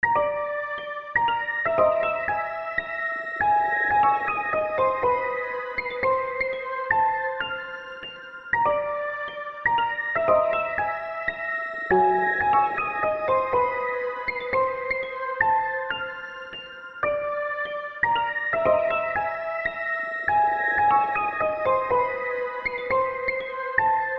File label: Haunting Piano, Spooky; TS Sounds; falling rooms; Unity